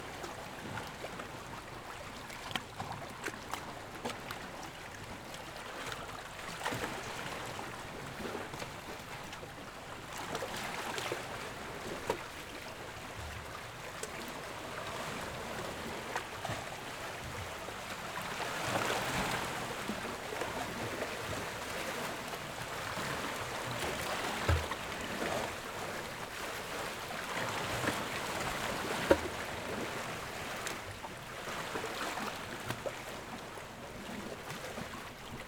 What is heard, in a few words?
Port ambience, small waves hitting boats; Sound Ex Machina; Mediterranean Summertime